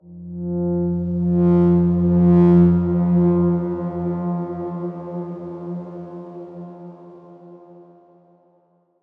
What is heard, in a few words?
Darkswarm F2 ff; HSS Ableton Pack1